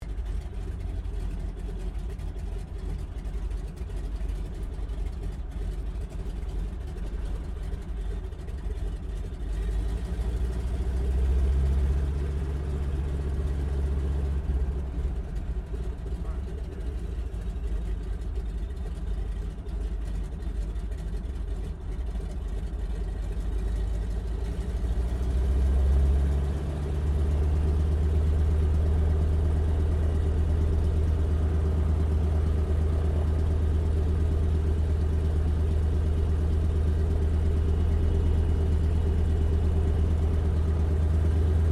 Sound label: AIRCRAFT BOEING PT-17 STEARMAN TAXI INTERIOR; PT-17 Stearman Trainer; Airplanes; Sound Effects:Vehicles